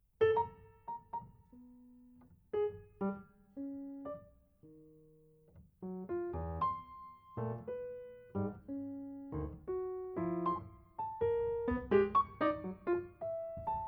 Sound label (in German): Klavierstück